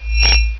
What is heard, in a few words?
Monster spell; Ultima III